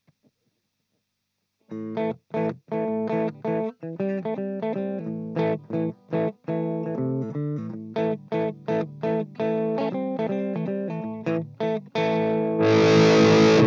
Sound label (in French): clean to satu 7 watts intermediaire